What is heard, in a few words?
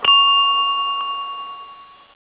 Bell